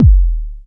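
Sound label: bass drum; 300 Drum Machines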